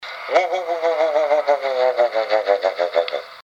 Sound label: Woo-Woo